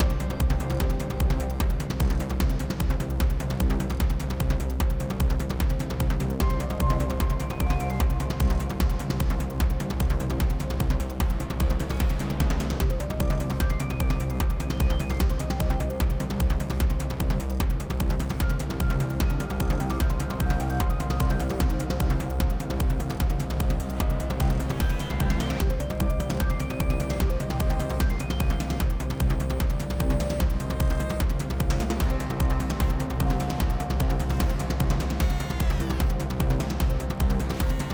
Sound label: Puzzle 8CH 75BPM; Benefactor